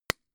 gun shot silencer medium; schusswaffen

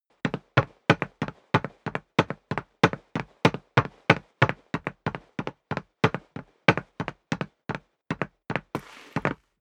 step boots wood parquet run sequence; schritte

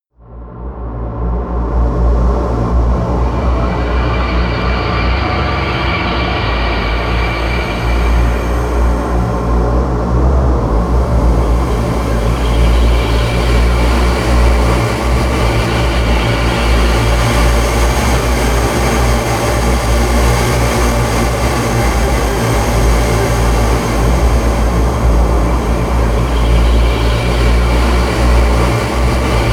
drone cold

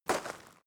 step gravel single; schritte; menschen

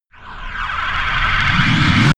windy air, risers

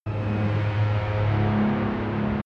spaceship screech low noise; raumschiff

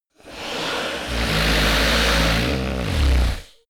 monster snore breath low; comedy-cartoon; soundpool

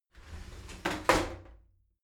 shower slide doors open soft; objekte; tueren

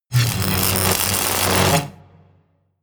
electricity

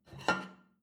plate take table slide hard; berufe; soundpool

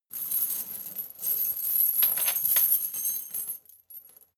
metal chain midsize handling slow

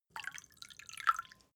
glass pour faint; soundpool

